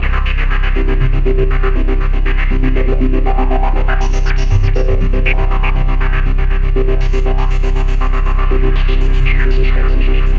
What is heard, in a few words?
Roland JV 2080, poly saw